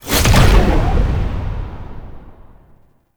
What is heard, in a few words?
Train Portal Close Stinger; Ambience; Gameplay; Warframe; Entrati